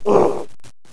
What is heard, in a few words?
bump; opentdm; critterz